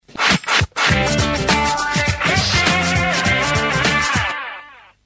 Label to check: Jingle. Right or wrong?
Right